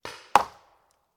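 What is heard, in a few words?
Paintball Gun Impact; Sound Ideas; Guns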